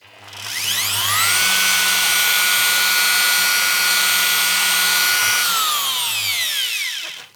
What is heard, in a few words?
Milling Machine; SoundBits; Electro-mechanics Toolkit 2